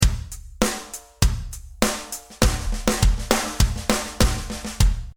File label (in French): fill débutant